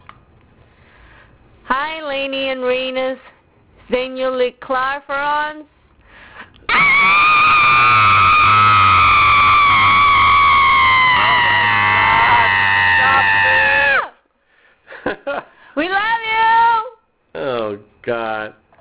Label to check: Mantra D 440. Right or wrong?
Wrong